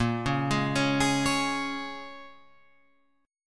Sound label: Bb Minor 7th, Guitar, Open F, chord; Bbm7 arpeggiated